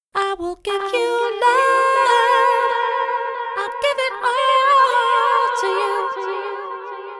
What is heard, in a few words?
Vocals Delay Tweaks No Comp; sidechain compression in ableton live